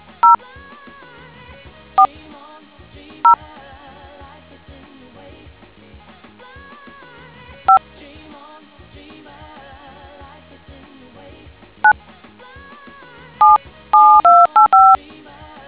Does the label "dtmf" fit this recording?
Yes